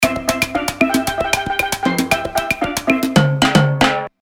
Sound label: Ringtone Steelpan beat